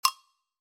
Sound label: Spin